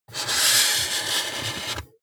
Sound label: marker mark normal